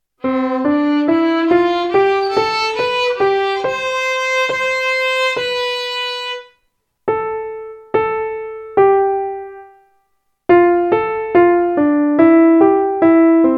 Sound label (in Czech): Klavir Housle Klavir